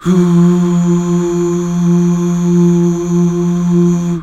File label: UUH